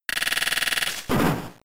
Needle Arm; pokemon; gen 3; attack moves

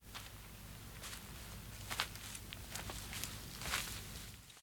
Footsteps Grass Walk; The 39 Steps

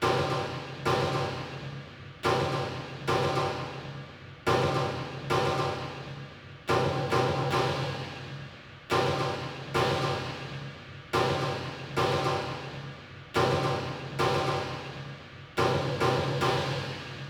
Ship Main Klang; Far Cry

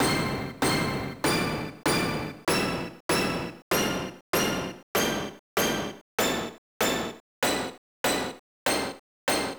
Sound Scale Check; Five a Side Soccer; Konami Ultra Sports; arcade

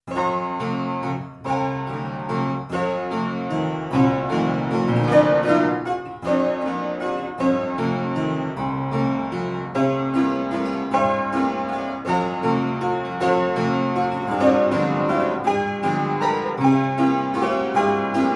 Piano mono; dead man's hand; windows; xbox

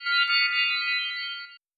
Drop; MagicTower

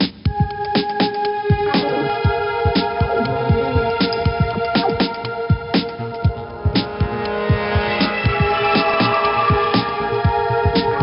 Bkg Music; math blaster in the prime adventure

